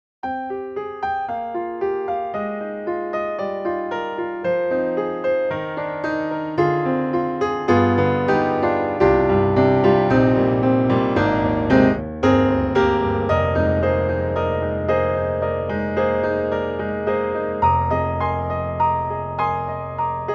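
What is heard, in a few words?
Side Mic, piano; VI Labs; Ravenscroft 275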